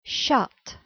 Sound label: shut